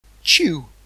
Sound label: chew